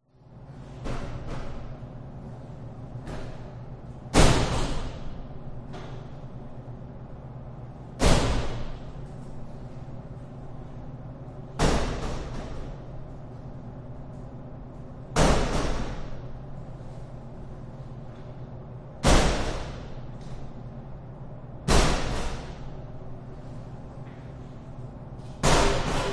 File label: public toilet doors slam close reverb roomtone hum fan